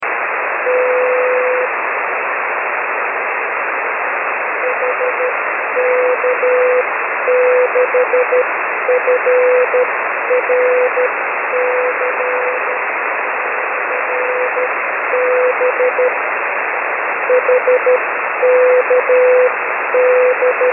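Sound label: 6m beacon; HK6FRC/b; 6 Meter; N1BUG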